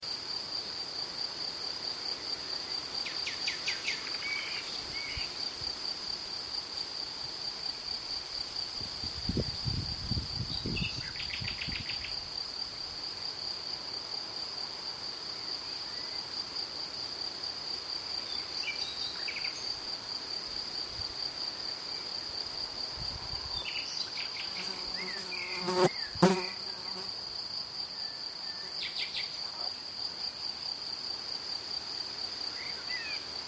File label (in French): oiseaux